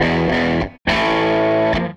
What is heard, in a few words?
Gretsch Guitar Loop